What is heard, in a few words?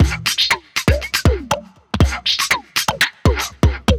Snappy Vowelizer Kit, Vocal Percussion; Instruments; Ableton; Factory Packs; Voice Box